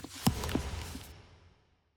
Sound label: witch lodge work start